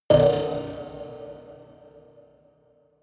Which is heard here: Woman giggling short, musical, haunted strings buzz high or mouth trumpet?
haunted strings buzz high